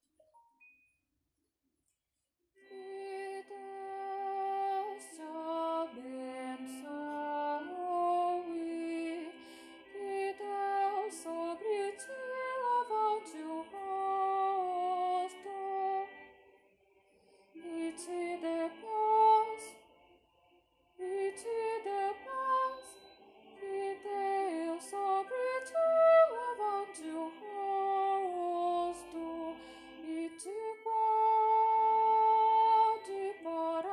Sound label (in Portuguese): soprano; bencao aaraonica